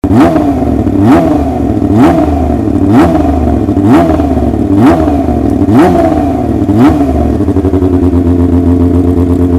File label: Racing Sound